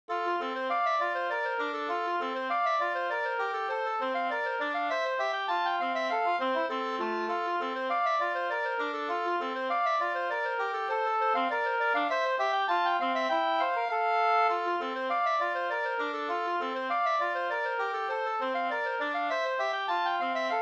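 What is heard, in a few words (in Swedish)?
slangpolska, two-part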